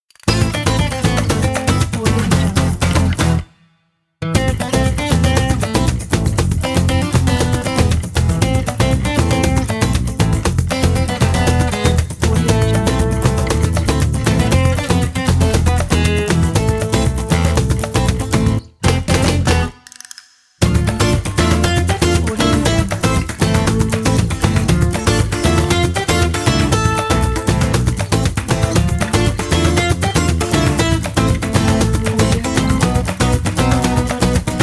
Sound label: spanish fever